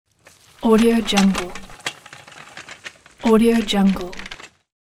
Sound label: wheel cart